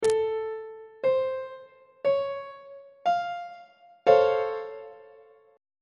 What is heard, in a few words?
d flat augmented major 7th 2nd inversion on treble clef